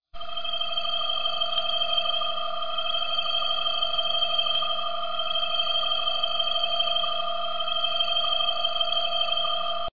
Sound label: iphone 4 laser sms ringtone